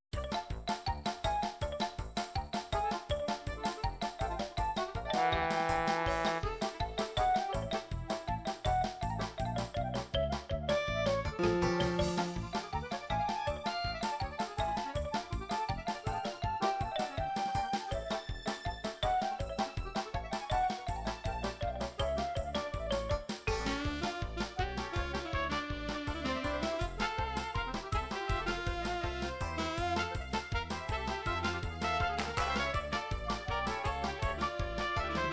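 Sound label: russian ukrainian Ukrainian polka, midi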